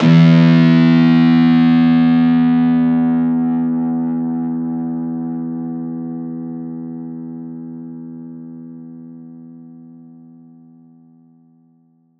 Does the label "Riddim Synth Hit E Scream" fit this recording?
Yes